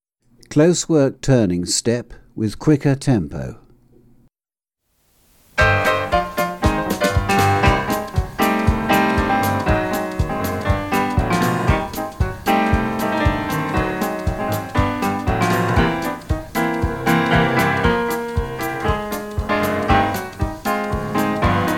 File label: Closework turning step quicker tempo, tap